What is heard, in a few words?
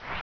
maggot run